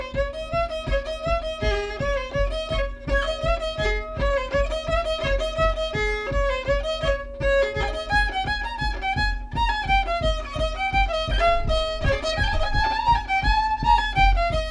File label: mazurka; de l'Anglars